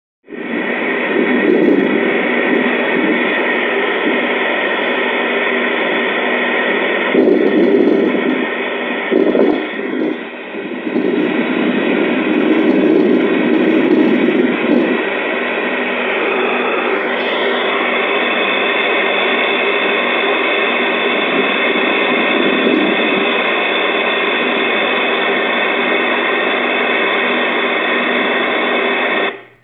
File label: rustling